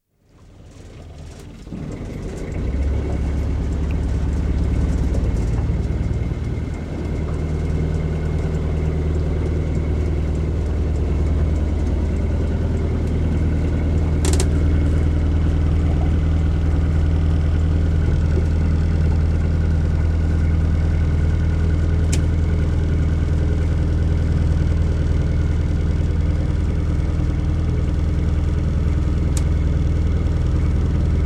Cabin Cruiser, atmosphere in cabin, constant run at cruising speed, slow & stop (27ft, 50hp diesel engine); Boat Sound Effects; BBC